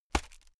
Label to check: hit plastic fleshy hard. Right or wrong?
Right